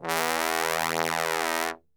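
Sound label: E1 bass trombone, Brass; Best Service; Brass Super Section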